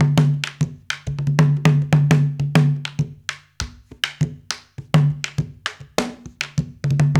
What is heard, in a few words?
Haitian Congo, World Dance